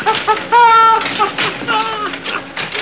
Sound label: Penny laugh